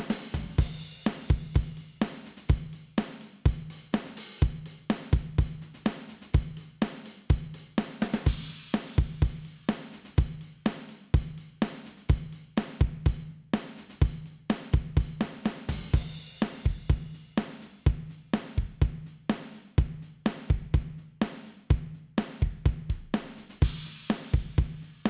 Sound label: drum